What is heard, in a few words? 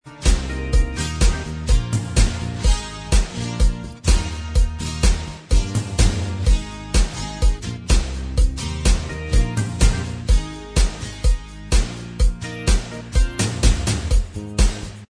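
Instrumental Track, backing tracks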